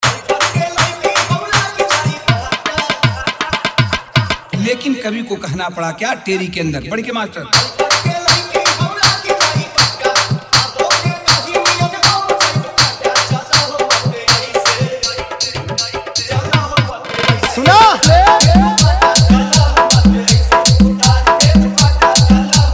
Old Bhojpuri Remix Song Floor Dance Mix Jhan Jhan Bass